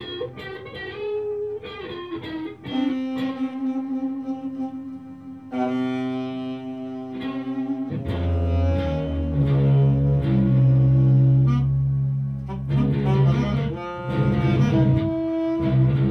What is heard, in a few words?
ambient